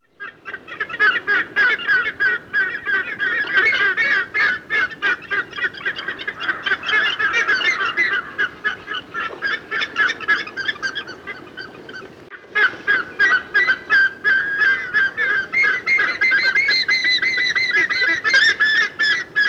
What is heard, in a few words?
Black Ibis, bird call